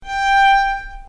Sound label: violin G5 louder